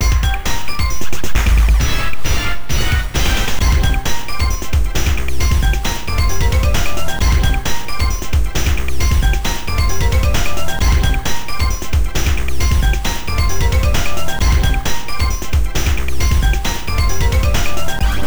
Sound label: Pinball (Pinball Stage BGM); Poly-Net Warriors; Konami Polygonet hardware